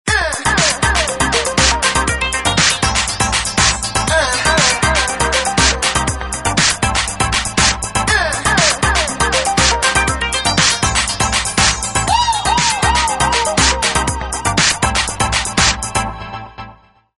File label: Ringtone, Samsung GT-C3510